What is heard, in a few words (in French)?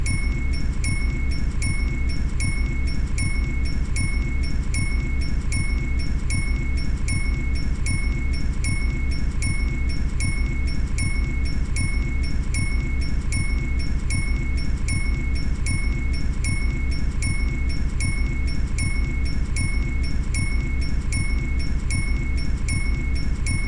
sonnette tempo